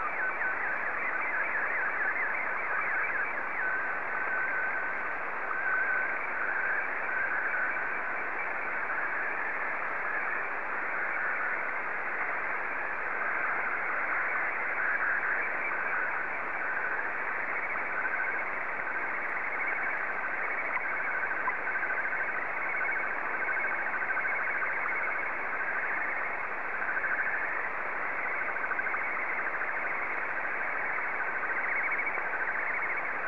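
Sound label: Martin 2, slow-scan television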